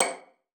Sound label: C#7, VIOLIN SPICC; Xsample VOL-5